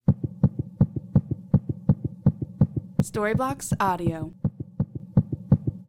fast heartbeat

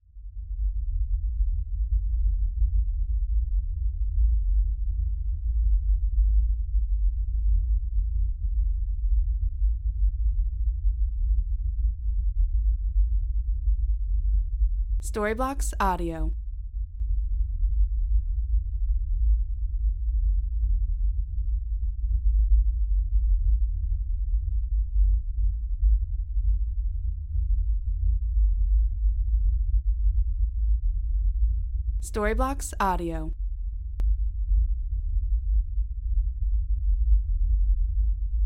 lfe rumble

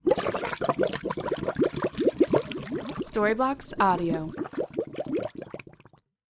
water bubbles gurgling water

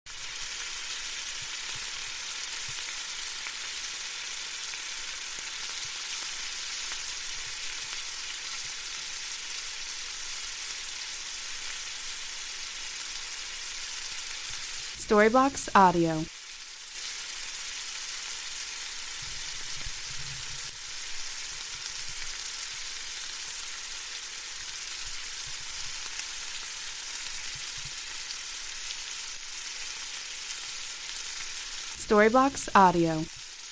frying bacon

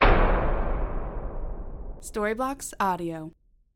horror hit low reverb impact

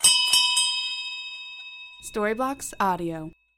ships bell

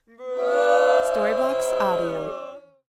young group booing

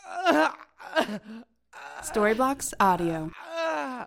male pain sequence

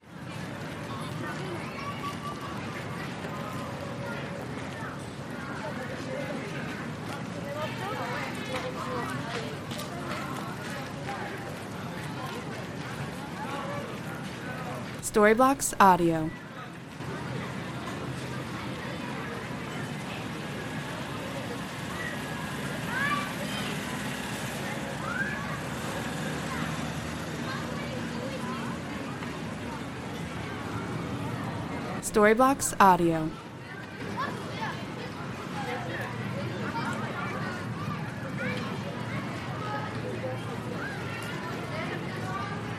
park ambience

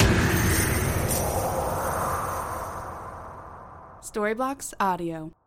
horror hit chains ghostly reverb

